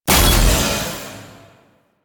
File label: Shrine Assemble Frag Deposited; Warframe; Duviri